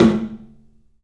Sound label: TOM, 3D Drums, CLUB TOM; 3D Sound; CLUB 08TOM